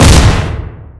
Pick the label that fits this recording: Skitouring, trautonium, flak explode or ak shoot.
flak explode